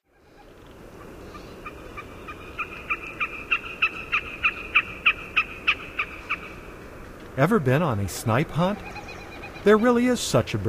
Wilsons Snipe; North America; Common Western Species; Know Your Bird Sounds

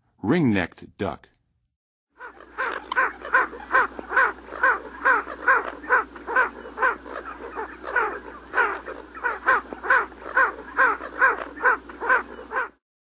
Ring-necked Duck, Songs of Birds of North America; Western USA; Western USA Songbirds

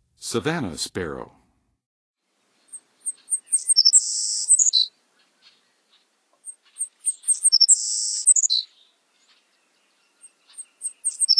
Savannah Sparrow; North America; Western USA; Western USA Songbirds

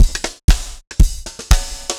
dancefloor tight 120

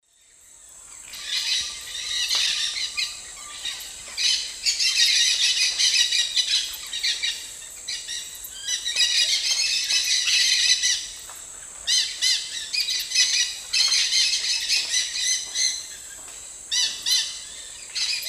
Aratinga weddellii, group flight calls; S. America; Eastern Lowlands

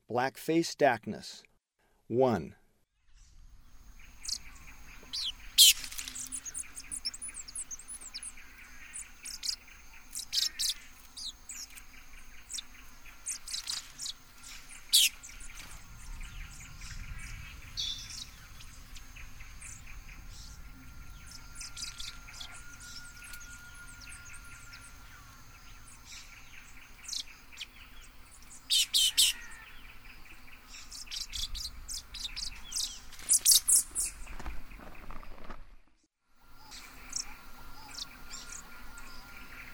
Black-faced Dacnis; S. America; Eastern Lowlands

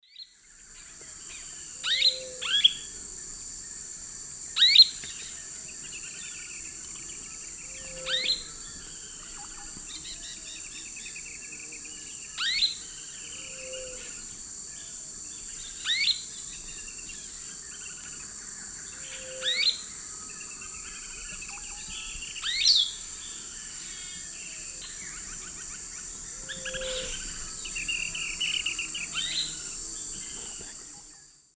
Elaenia gigas, call and song; S. America; Eastern Lowlands

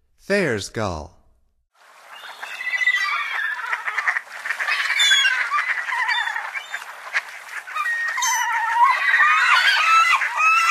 Thayers Gull; North America; Stokes

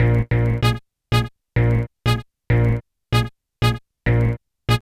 Guitar, RnB

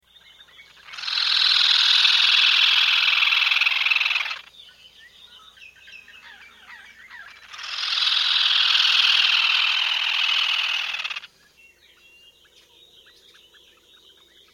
White-Throated Crake, S. America

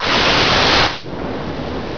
CUT TORCH; 动物

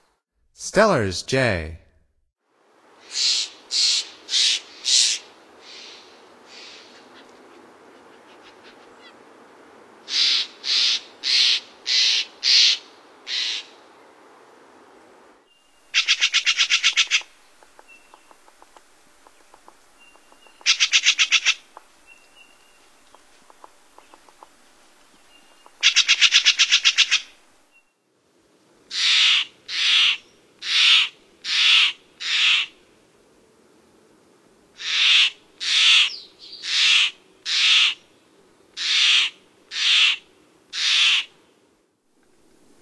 Stellers Jay, Songs of Birds of North America; Stokes

